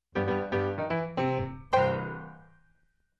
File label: Show Piano Transition